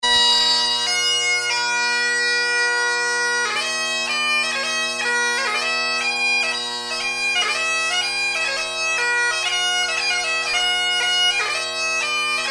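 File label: Strathspey, bagpipes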